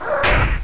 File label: FTSTEP; THEXDER